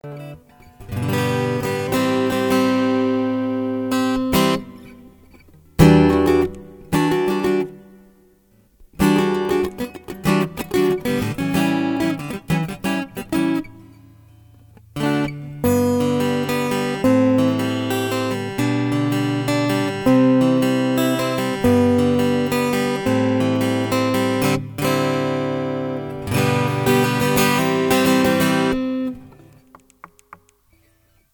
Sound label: taylor es